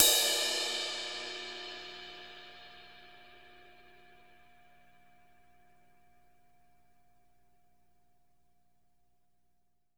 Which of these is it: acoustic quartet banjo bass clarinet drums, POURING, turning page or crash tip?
crash tip